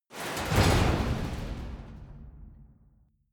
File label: metal long